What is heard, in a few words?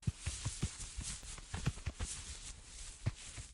Spool Player In Web; Lethal Company